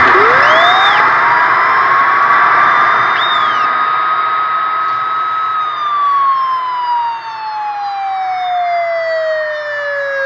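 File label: Home run noise, baseball pinball arcade game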